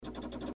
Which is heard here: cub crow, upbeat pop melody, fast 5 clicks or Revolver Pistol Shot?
fast 5 clicks